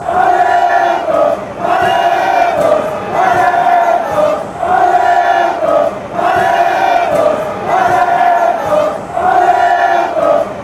fan chants; malentos

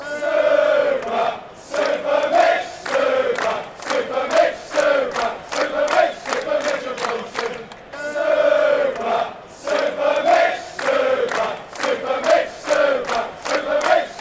chants; super mitchell johnson

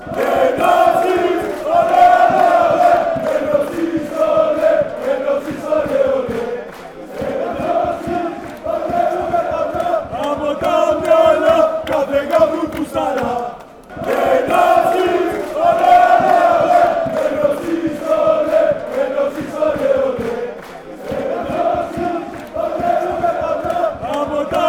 aek athens chant

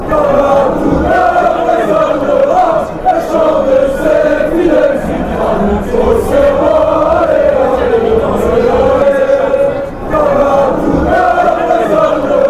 auxerrois, chants

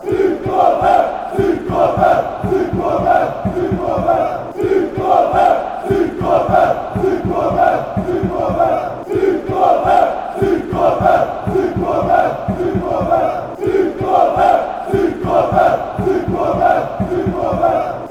chant; b moenchengladbach

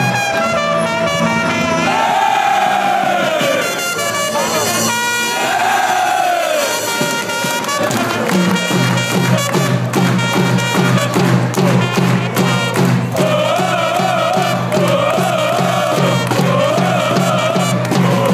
trumpet, chants; south melbourne

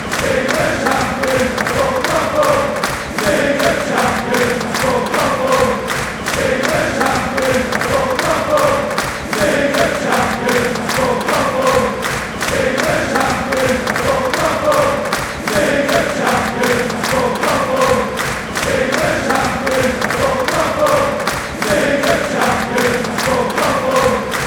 fanchants; england

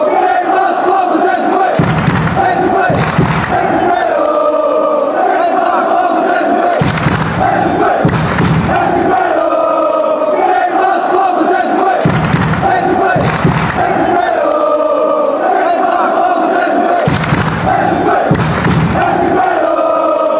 claps tripeiro; pfc

